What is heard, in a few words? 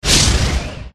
mage attack contact middle